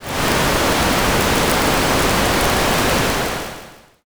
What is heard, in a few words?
fire